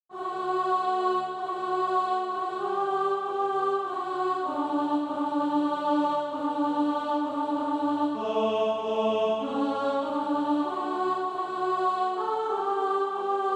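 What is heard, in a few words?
alto; SATB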